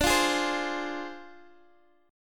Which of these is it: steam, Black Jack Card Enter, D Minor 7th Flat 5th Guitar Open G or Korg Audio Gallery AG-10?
D Minor 7th Flat 5th Guitar Open G